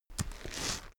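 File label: step gritty stone step, footsteps; schritte; menschen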